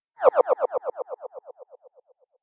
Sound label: whizz